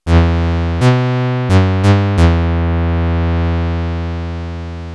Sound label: 1985 JX-8P key mode solo